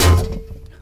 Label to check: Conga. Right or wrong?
Wrong